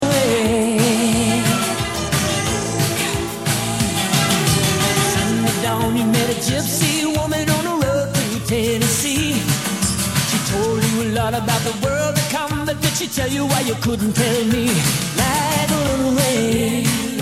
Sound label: Vox Humana